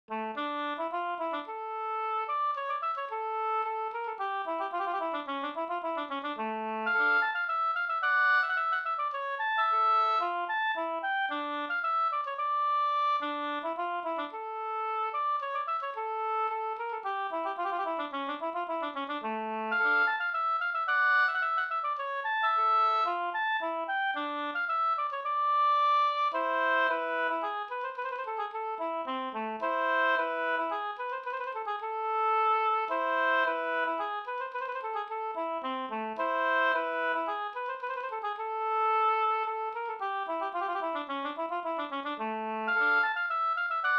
polska Bingsjopolska; e Soderholm

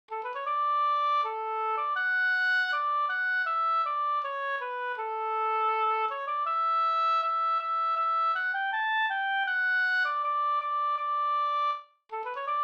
brudmarsch; fr Kumlinge; e Otto Silander

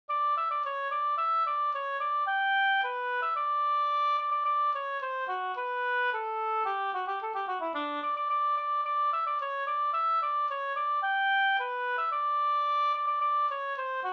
polka; Anglapolketten

